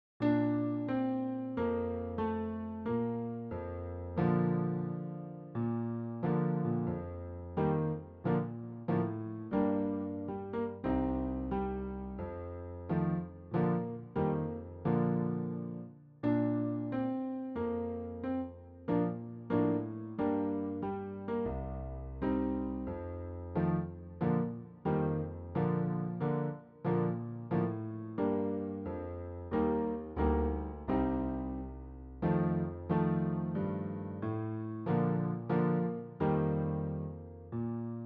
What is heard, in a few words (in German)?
Lieder Trompete; Bauermax